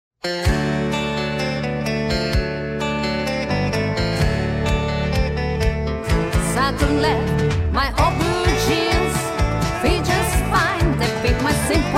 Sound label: high heel woman with a warn out shoe vocal